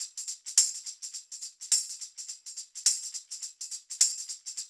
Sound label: percussion loop; InVision Interactive; Percussion Slam